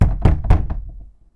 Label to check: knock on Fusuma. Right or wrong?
Right